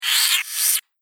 Terror Soul Suckers, evil sound; Horror Sound Effect; Andrew Fleming

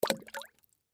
Water Splash Small Toy Bathtub; Blastwave

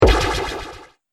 Laser Percussion Shot, Laser Gun

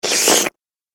Slurpy Hungry Sound, slurping; human

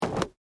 Jeans Drop, Foley, Clothes, On, Ground; Dressing (Sound Effects:Human)

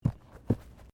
Body movement Fall Light Double; Body movement foley to simulate falls and deaths; Wrestling And Struggling; SoundEffects-Foundation1

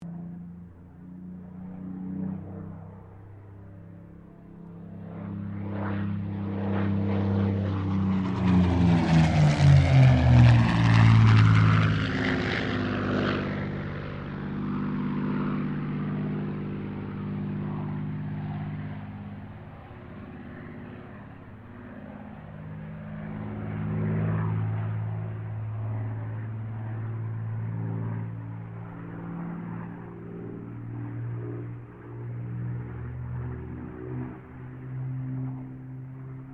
AIRCRAFT SUPERMARINE SPITFIRE MK-XVI PASS

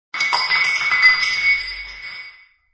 Bowl Brk, Bowl breaks and shatters on hard floor.; Kitchen Sound Effects; Household

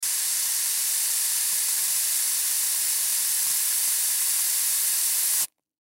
Aerosol Can Hair Spray Long; Spraying; Objects; Blastwave; Foley

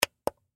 Switch Push, Switch Push Momentary Click; Switches; Objects; Blastwave; Foley

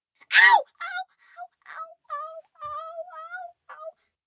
Pain Whimper Ow, 1 child, high-pitched, several; Ow, Ouch

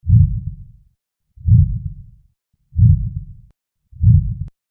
Flight Of The Dragon; Monster Sound Effects; fantasy; Andrew Fleming

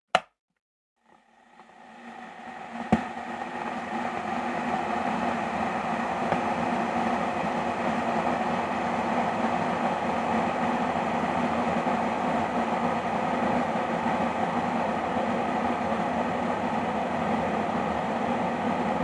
Kitchen Kettle Boiling

